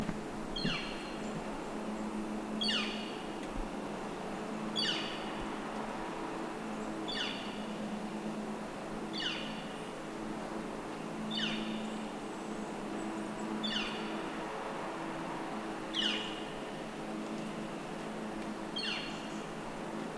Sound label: call, birds